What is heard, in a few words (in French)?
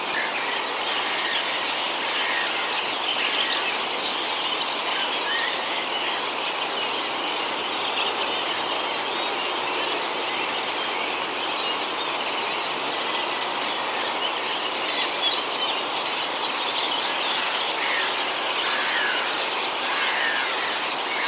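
étourneaux sansonnets groupes ville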